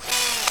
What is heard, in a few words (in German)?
BOHRER FX; Best Service; Advanced Mega Effects